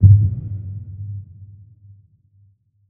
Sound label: Ice shark damage; Barotraumatic